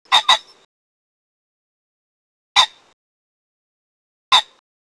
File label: pheasant; birds